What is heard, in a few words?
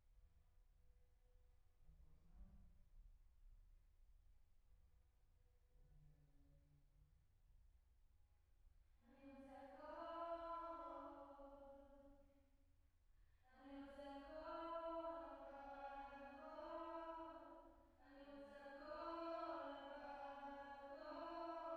Choir